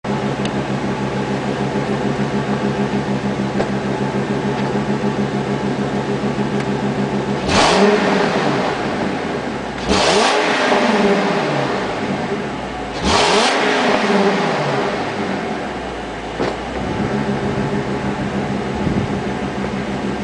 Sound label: v10 headers stock exhaust